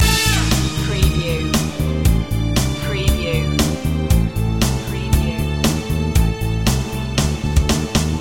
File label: spy lounge loop